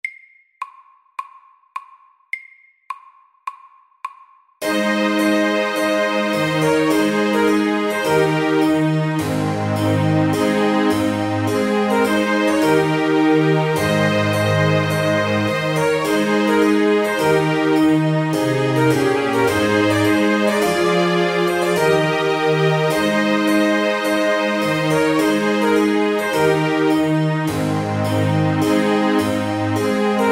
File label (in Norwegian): Trumpet tune SAKTE